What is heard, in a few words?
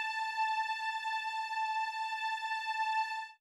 overlap, String